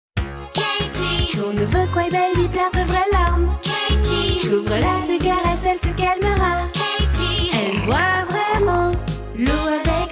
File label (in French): Publicite TV chantee